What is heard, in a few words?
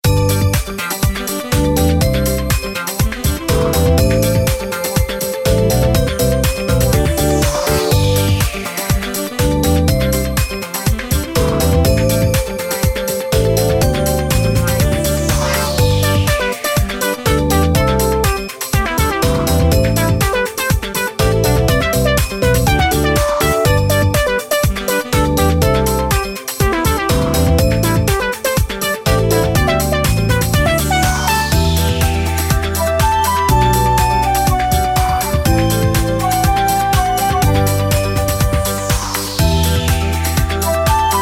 Level Music; Family Restaurant; windows